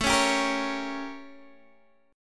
A 7th Flat 9th Guitar DADGAD; x,12,11,12,13,11

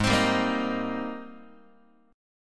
Ab Major 9th Ab Guitar; AbM9; strummed; x,4,3,5,2,2

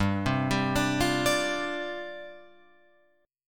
G 7th Suspended 4th G down Guitar Open F; Listen to G7sus4 arpeggiated; G7sus4 Chord

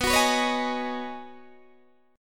B 6th Add 9th B Guitar DADGAD, chord

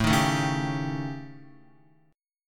A 7th Flat 5th, down, Guitar; A7b5 strummed; JGuitar

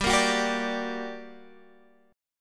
G Minor Major 7th G down Guitar Drop D

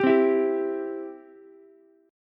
C Major, C, down, Ukulele; strummed; chord; JGuitar

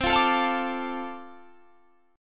F 6th C, chord

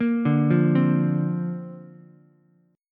D-Minor 7th Sharp 5th, D, Ukulele; Dm7#5 arpeggiated; 3,2,1,3

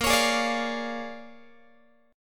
A sharp 7th Sharp 9th, Guitar; A#7#9; strummed; x,18,16,18,18,16

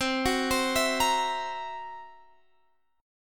Gb 7th Flat 5th C

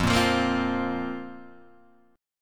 F Suspended 4th F, Guitar Half Step Down; Fsus4

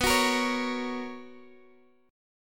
B Major 7th Suspended 2nd B down Guitar Open D

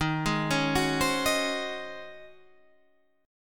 Db Major 7th Suspended 2nd Suspended 4th Eb

